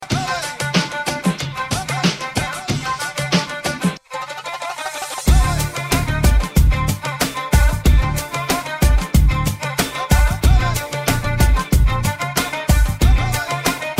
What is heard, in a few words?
arabic dance song